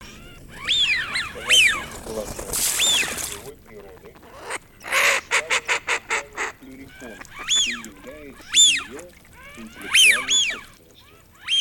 Свиязь Fischione; plurifon

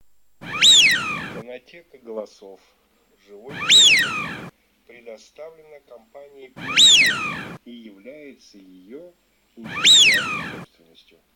Свиязь Fischione; plurifon

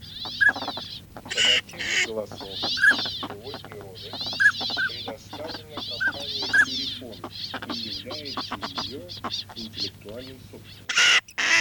Шилохвость Codone; plurifon

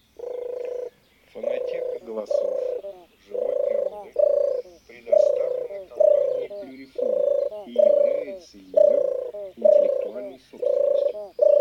Горлица Tortora

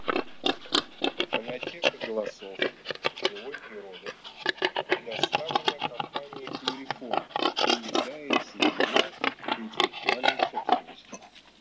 Кабан Cinghiale; plurifon

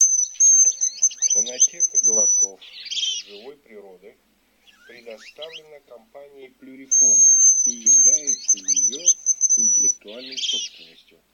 Рябчик Francolino; plurifon

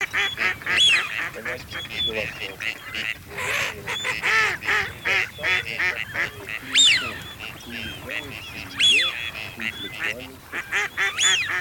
Свиязь Fischione Серая утка Canapiglia Кряква Germano; plurifon

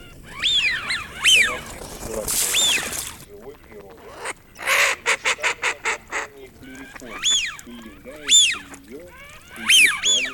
Свиязь Fischione; plurifon

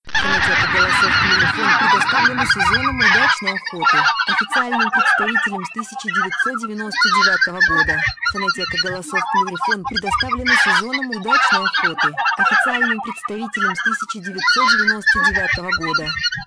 белолобый; plurifon

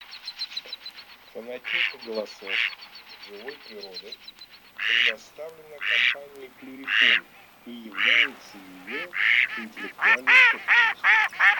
Кряква Germano

